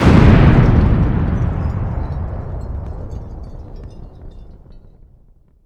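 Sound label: big explode, explosions; AKAI S-Series CD-ROM Sound Library VOL-6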